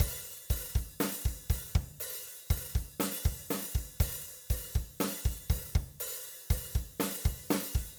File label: drum sEQ